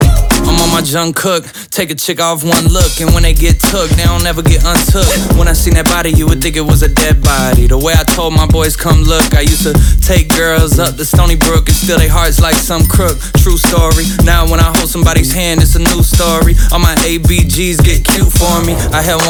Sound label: rap parts ringtone